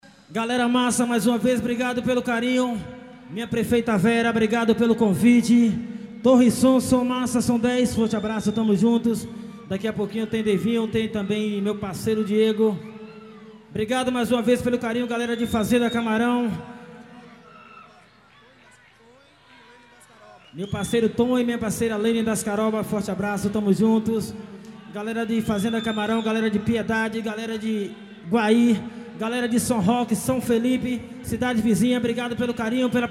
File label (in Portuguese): reggae